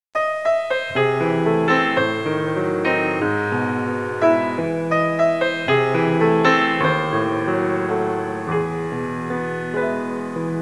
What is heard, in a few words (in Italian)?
suonerie classica; musica classical